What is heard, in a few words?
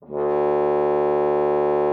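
French horn D2; Xsample VOL-3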